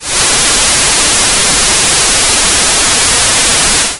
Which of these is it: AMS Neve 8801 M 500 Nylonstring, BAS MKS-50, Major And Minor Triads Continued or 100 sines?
100 sines